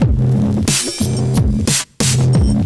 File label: Drum loops, Electro